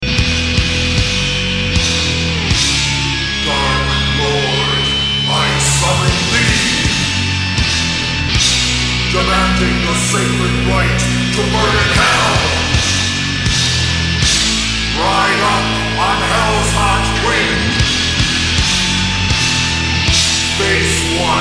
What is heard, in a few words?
Epic metal